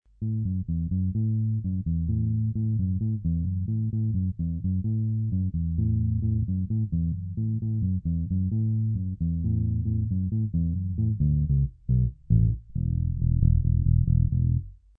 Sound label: EB3, bass; gibson